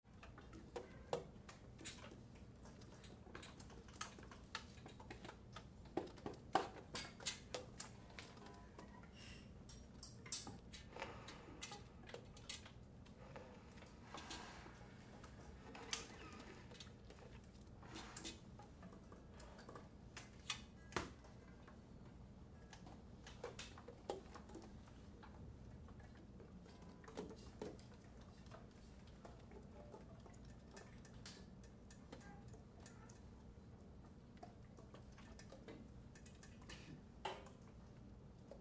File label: Activity Music Class Instrument Presence Light, School; Backgrounds; Schools; Big Room Sound